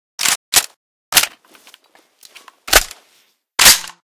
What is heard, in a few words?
aug reload, weapons